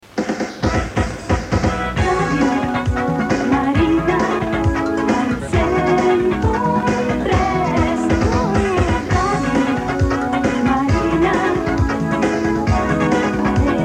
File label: JINGLE